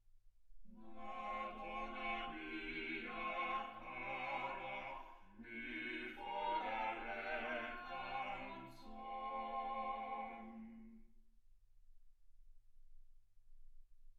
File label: quartet